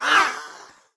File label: felidae damage, monster